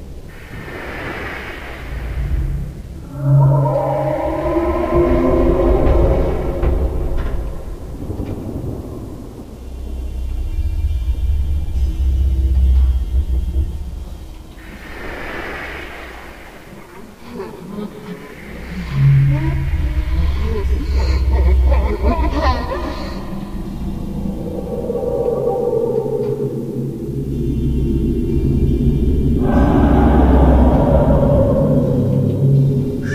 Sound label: tomb